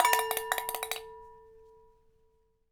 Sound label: Ping Pong Rattle, Large Wine Glass